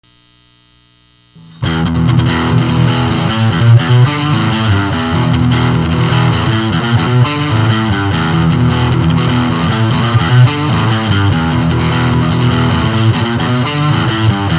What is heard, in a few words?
el34 altec strat